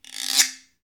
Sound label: Guiro Wood; Club-50 - Foundations Roland